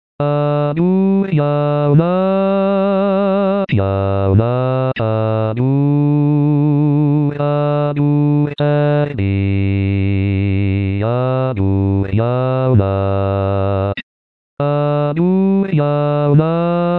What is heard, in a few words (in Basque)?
agur jaunak basse